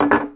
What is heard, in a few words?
bottle table